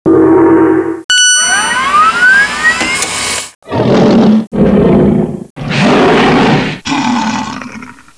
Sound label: dino; The Land Before Time Activity Center (Sound Source Interactive) (1998)